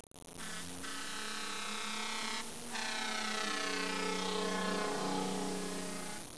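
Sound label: fire truck; Image Bonanza; Expert Software; transprt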